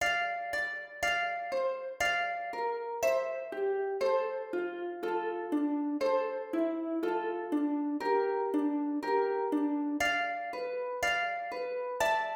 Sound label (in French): Psaume HARPE